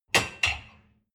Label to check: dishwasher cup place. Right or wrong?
Right